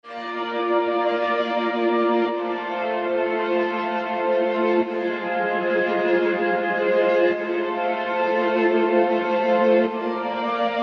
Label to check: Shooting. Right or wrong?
Wrong